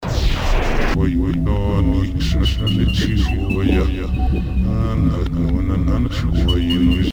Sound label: music